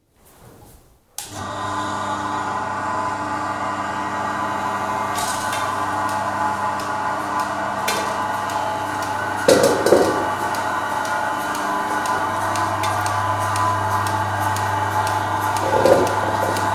Cow, interior, milked by machine, machine switched on & off, churn put down & suction of teat clusters heard; Cattle; BBC